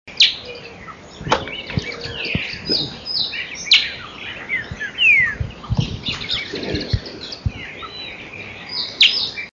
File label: cinereous finch